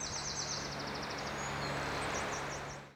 river bird; theodyssey

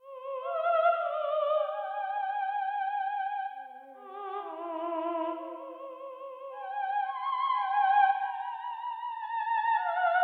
seirines voices; theodyssey

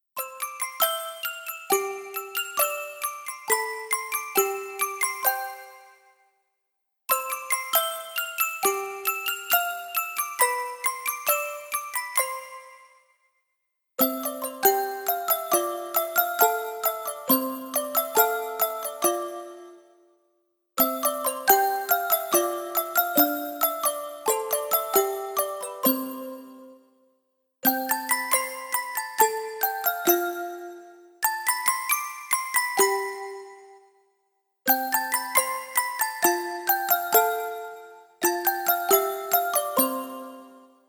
sleep song; theodyssey

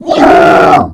creature attack; theodyssey

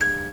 neon on

